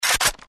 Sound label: Gun AK47 Cocking